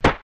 bump, Tools; Barotrauma

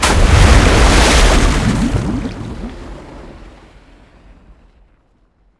Massive Breach; Mine; Barotraumatic

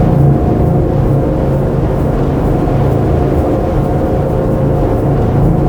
engine; Barotrauma; Subsurface

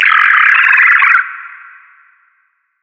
Lithomorph Idle; DynamicEuropa; Special Creatures

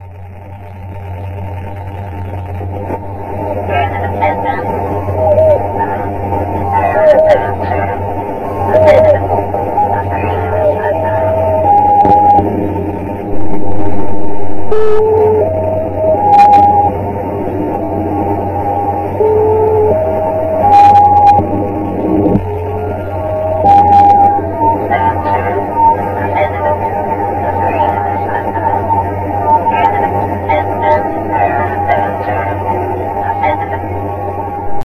radio loop; Barotraumatic; Carrier Leviathan

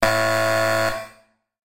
Alarm Buzzer; Barotrauma; TSFX

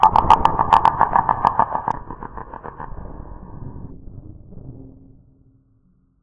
damage; Barotrauma; Barotraumatic; Legacyendworm

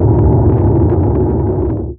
sonar Tail Suit Very Close Shuttle; Barotrauma; Real Sonar

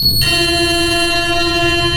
sonar Ping Water Medium Beacon Directional; Barotrauma; Real Sonar

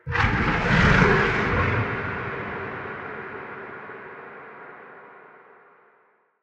shark; Barotraumatic; Paralyticshark

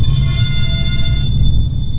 sonar Tail Water Close Beacon; Barotrauma; Real Sonar

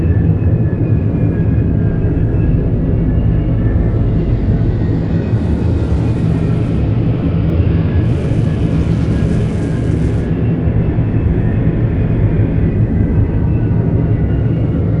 reactor fusion large; AA Dangerous Meltdown - EK Compatible

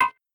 pick Item Fail; Barotrauma

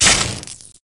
mini discharger; Electricity; EK modern

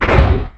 Mudraptor Damage; Barotraumatic; Cthulhu; InsanityMonsters; Hallucination2

